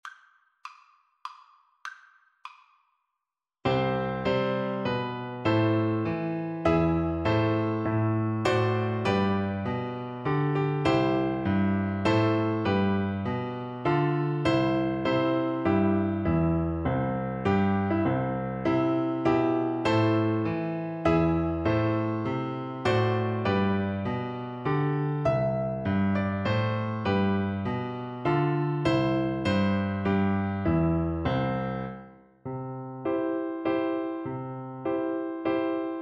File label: star spangled banner, Recorder